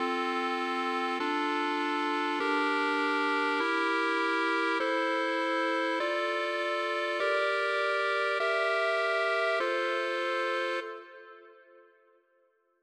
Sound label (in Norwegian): klarinettversjon